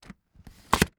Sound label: Household Bag Luggage Bag Handle Sliding In